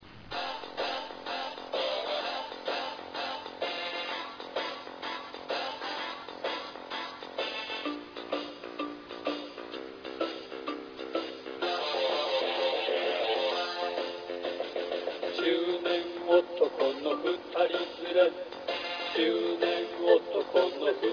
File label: taiko